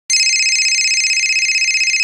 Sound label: Ring Standard; HTC AT&T Tilt 2